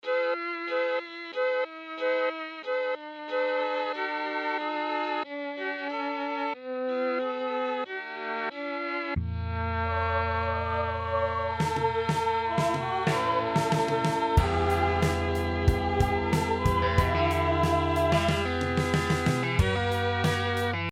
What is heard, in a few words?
melotron micro strings